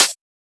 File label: Closed Hat; FLPRO